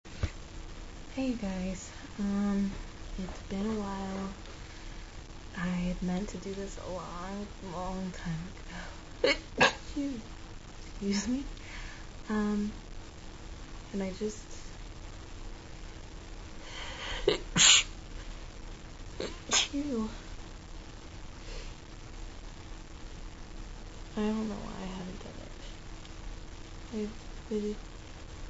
throat hurts, female

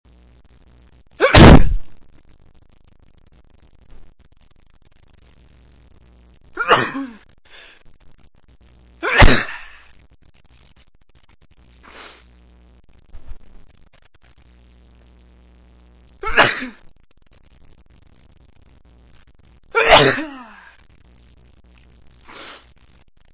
dusting, male

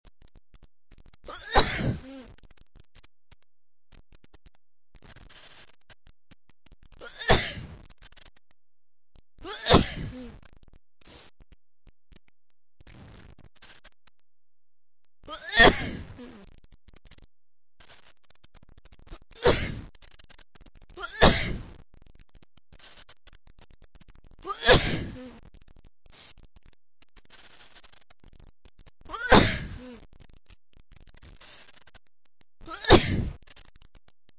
cold, male

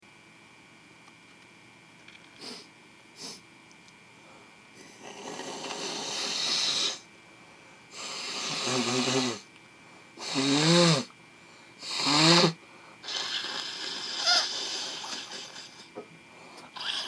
congested hay fever blows, male

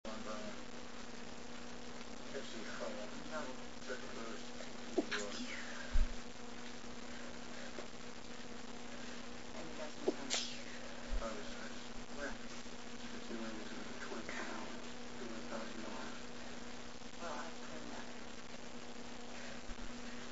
stifles, female

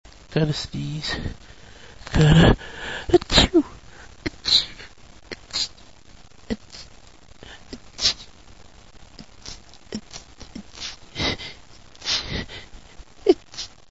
sudden sneezes, male

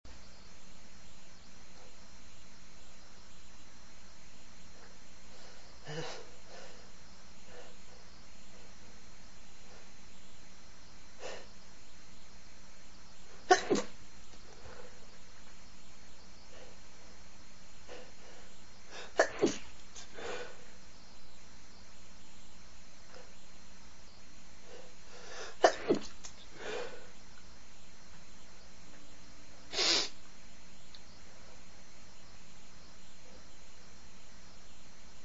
breathy stifles, male, sneezes

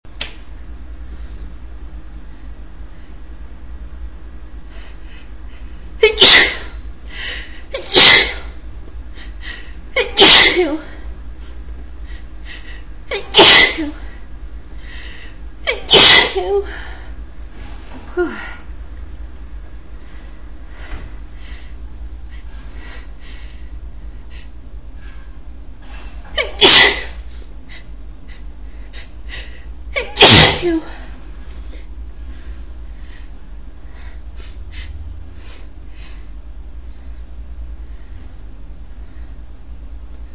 hitching fit, female

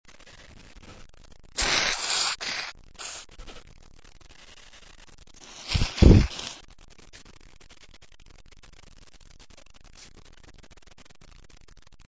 blow, male

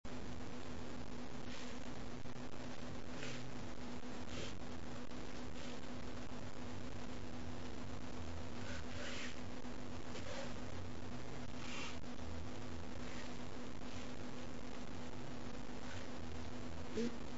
stuck sneeze, female

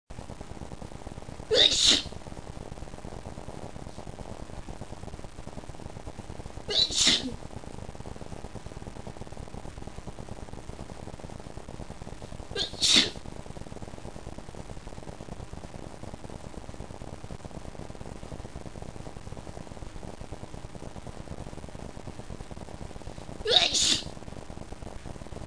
shy sneeze, female

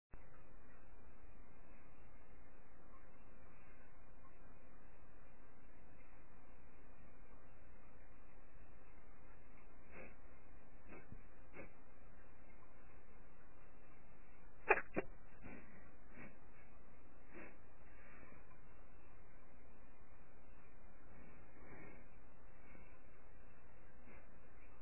rough stifles, female

